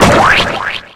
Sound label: Slime; Nickelodeon Super Brawl Universe; Android